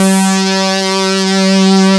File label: GRUNGE G3, SYNTHESIZER; GLUNGE